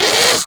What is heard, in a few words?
Combat Vocal Pain; Warframe; Catbrow